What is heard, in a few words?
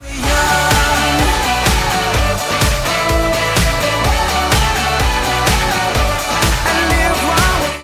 whoop